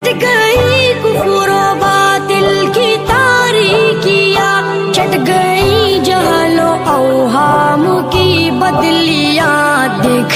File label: Beautiful Naat Ringtone